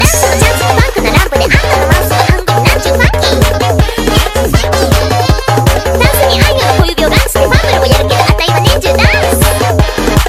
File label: dancing funky